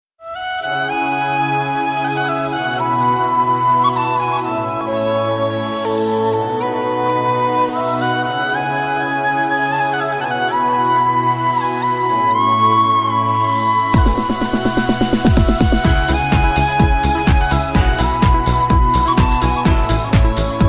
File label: Flute; Titanic